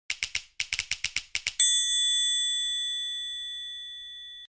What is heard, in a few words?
iphone 6s plus typewriter ringtone